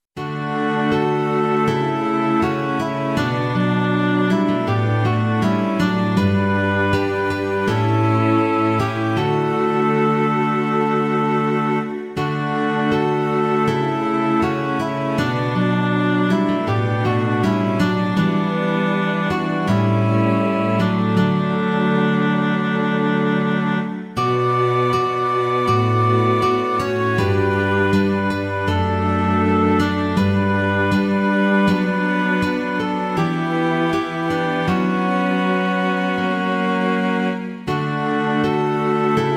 Ob Str Str Str Gtr

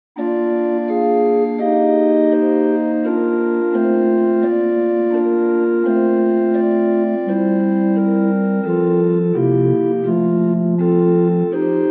Prelude, Liturgical Organ Books; hymn

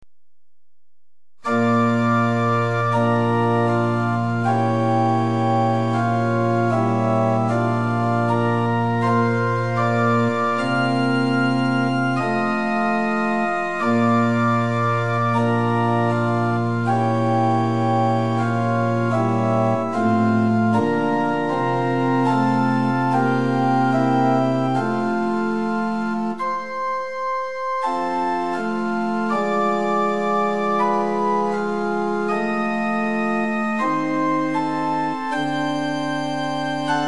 Liturgical Organ Pitch 440 Temperament Equal

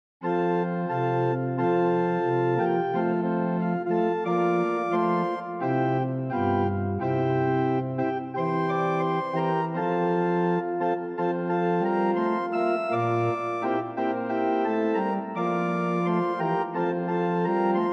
Organ; hymn

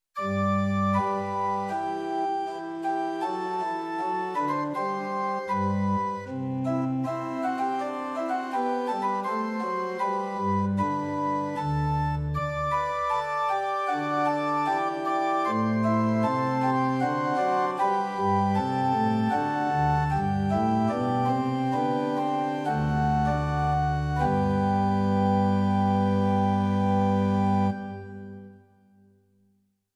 Short Organ Music Pitch 440 Temperament Equal; Prinz 8 4; hymn

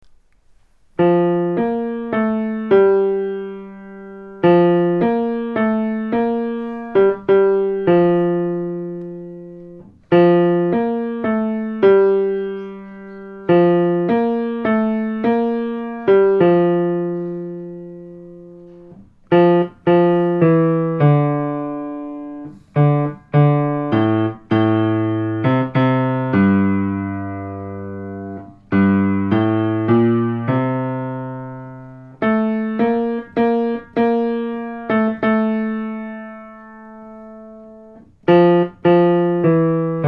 Part B Piano

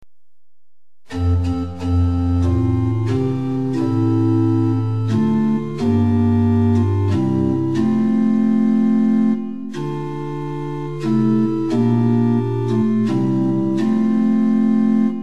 Pitch 440 Temperament Meantone

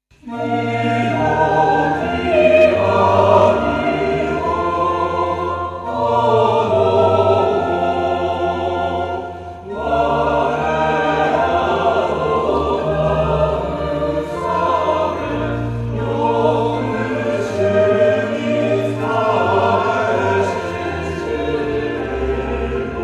Choir; Kashiwa Shalom Choir

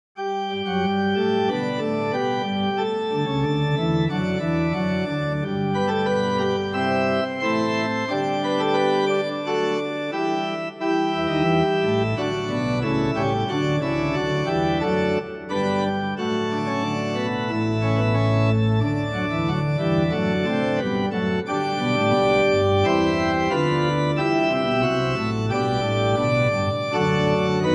Organ Books, Pitch 440 Temperament Equal; hymn

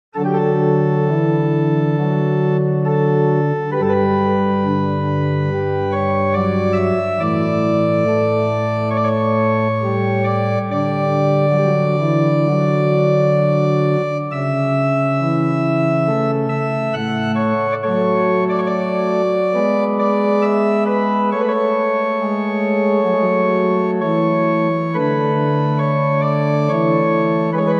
Short Organ Music; hymn

